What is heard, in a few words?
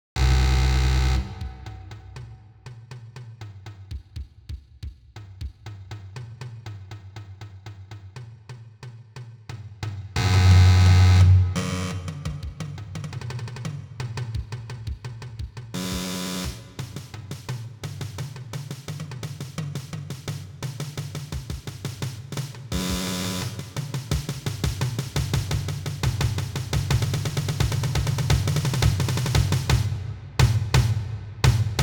Battery